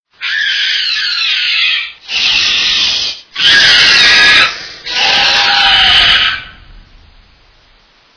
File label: Alien Roar